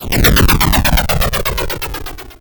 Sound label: lazer wall off